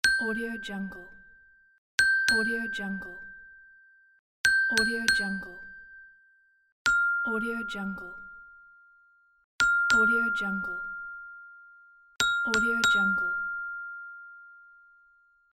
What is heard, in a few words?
bell ding